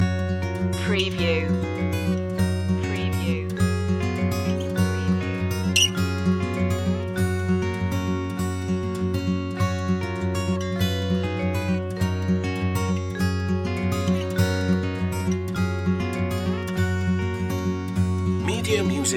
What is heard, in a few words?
get up and go loop, acoustic, country folk